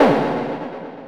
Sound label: hit, sfx; anvil